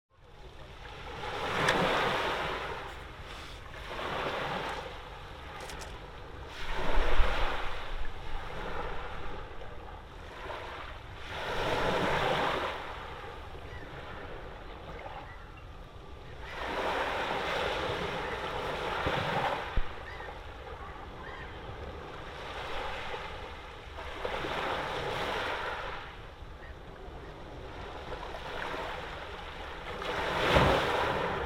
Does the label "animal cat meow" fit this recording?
No